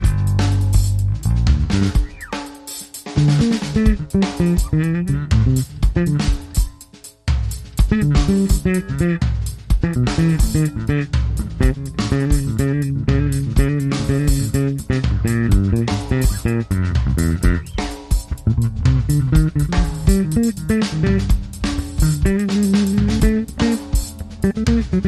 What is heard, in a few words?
Solo Bass Groove